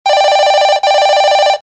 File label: Ring Ring